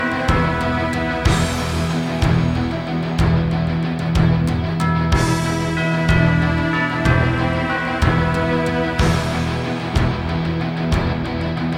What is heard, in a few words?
Instrumental